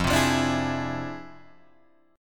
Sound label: F Minor Major 13th, Guitar, DADGAD